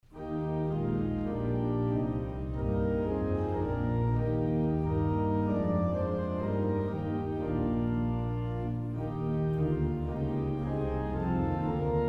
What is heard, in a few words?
hymn